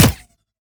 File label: Sciweap Shot Small Gun Bolt Colt; Robobeat